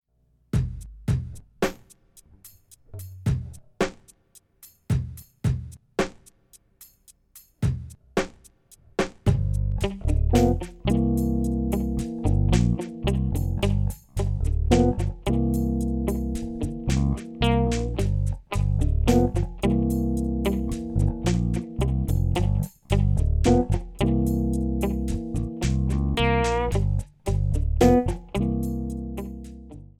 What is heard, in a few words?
16th funkballad